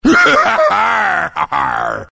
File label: laugh; freak fortress 2; gangplank